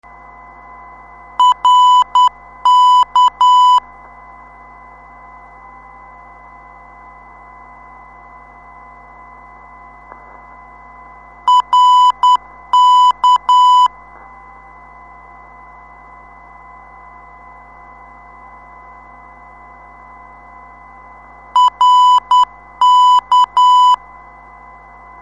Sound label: rk 359khz, ndb